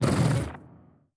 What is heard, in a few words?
biter call behemoth, creatures